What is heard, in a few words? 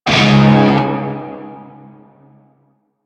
Shawzin Chromatic Chord B; Music; Warframe; Narmer Shawzin